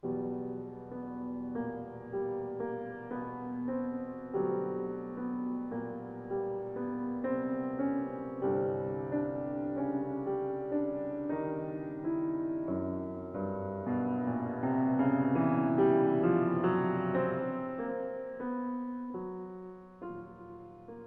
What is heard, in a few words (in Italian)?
moto interiore, Piano